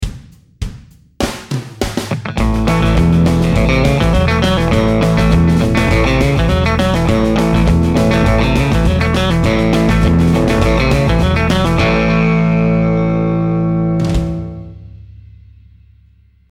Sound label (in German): Country Licks Lick